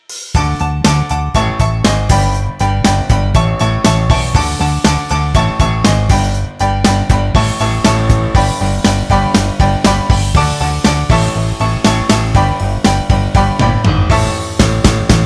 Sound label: Dancing Your Feelings Instrumental